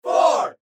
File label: Crowd